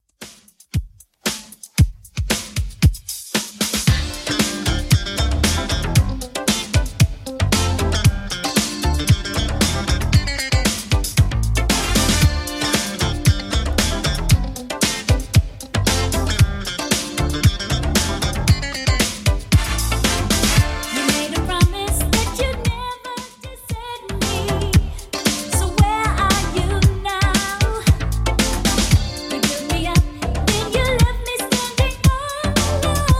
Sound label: 80s Redrum 115bpm